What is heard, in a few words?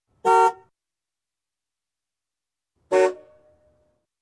Car Horn x 2; Sound Ideas; Series 2000